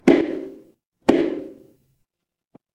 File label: instrument wood block